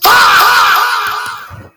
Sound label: faaaaaaah